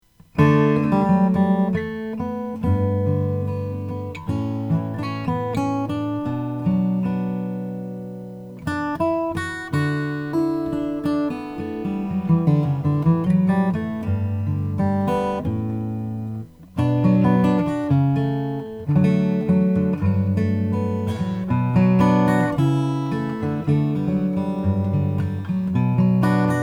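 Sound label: galloup northern light brazilian rosewood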